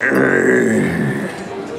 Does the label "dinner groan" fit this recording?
Yes